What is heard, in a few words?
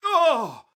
Scream